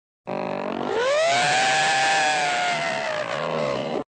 human roar

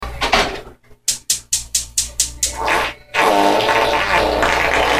fart cluster

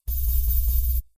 ui sound effect

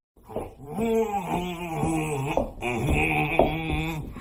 happy hum

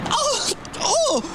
berries moan

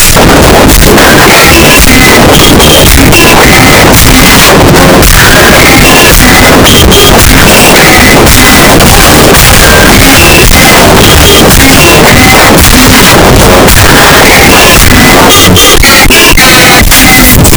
bassboost

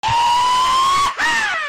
zombie; pvz

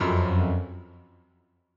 error code

